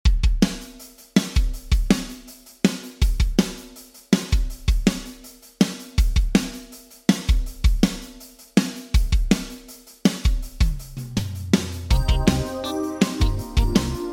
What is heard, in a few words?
Drums Eventide H8000 Drum Flanger; Vintage Digital